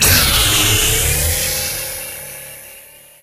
laser activation; Source Engine; Portal 2

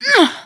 pain, female; Source Engine; video game; npc

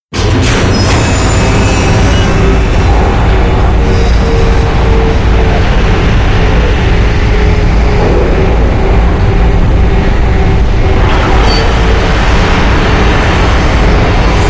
bridge lower; video game

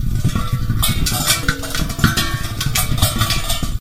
canister roll loop, metal; physics; Source Engine; cstrike

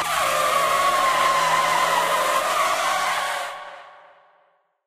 bumper car screech; tf

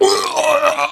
choke, biker; source engine; left 4 dead 2

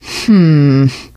negative noise, teen girl, survivor voice; Left 4 Dead; Source Engine

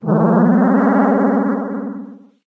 science question, windows 9x sound schemes

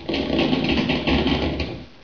creak; Source Engine; cstrike

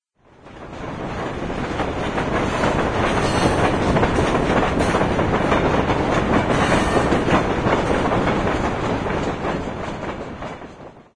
train pass, ambient machines; Source Engine; cstrike

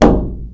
glass sheet impact hard

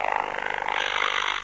idle, antlion; Source Engine; video game; npc

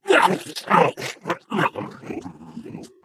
ballbot crushed

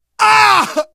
hurt major, survivor voice, manager

